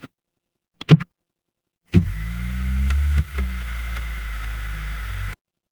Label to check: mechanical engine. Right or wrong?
Right